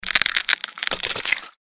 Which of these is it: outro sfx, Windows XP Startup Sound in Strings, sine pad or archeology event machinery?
outro sfx